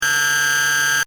Robot move loop, Robot: Robotic Movement Style Effect; Robots